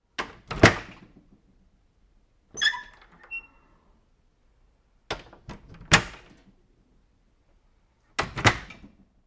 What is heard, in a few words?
Office Door Close